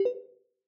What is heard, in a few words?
dodoo noclick